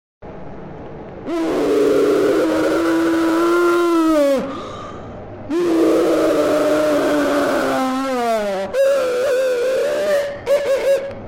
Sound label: Guy Screaming Rauuhrr